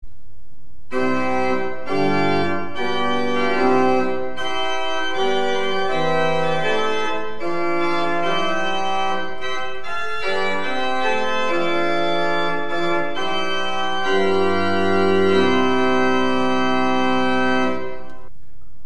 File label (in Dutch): orgel, Prestant 8', Octaaf 4', Quint 3', Octaaf 2', Manuaal